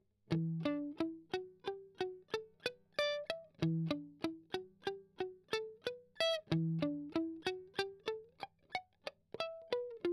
Strum Octav Arp

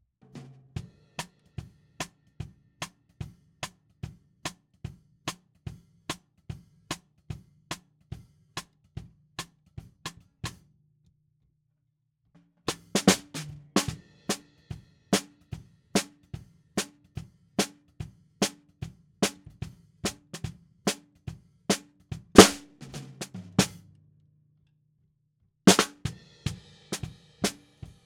SNR BTM

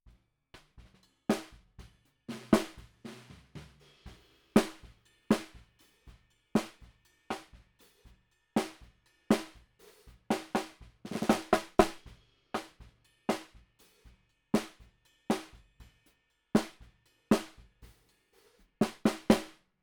SNR Bot

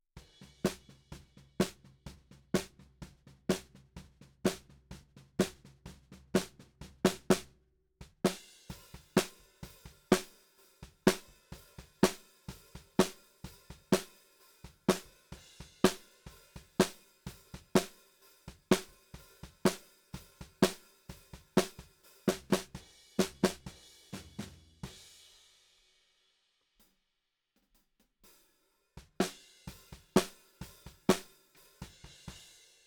Snare Bot

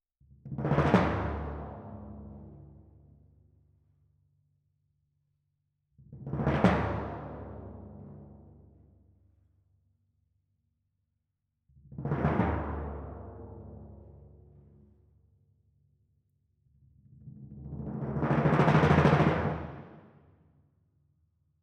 Timp Main

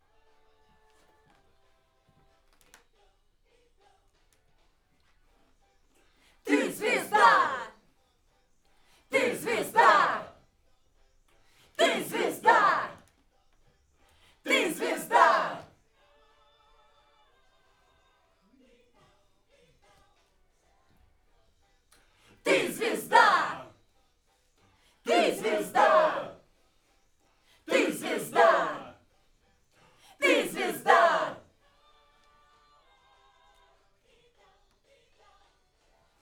Backs AKG

